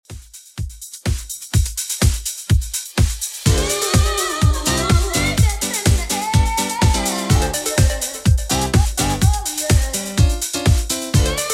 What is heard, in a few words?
90s Redrum 125bpm